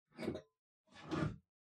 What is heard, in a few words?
Pendulum Clock Hardware Far Open